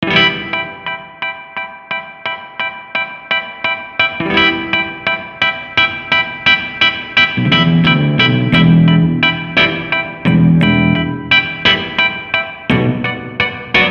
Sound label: electric 88 piano; waves